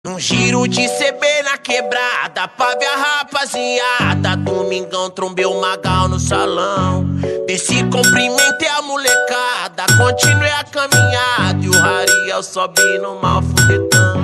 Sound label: Beats; toque de celular